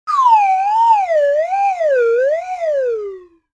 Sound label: Slide Whistle Twirl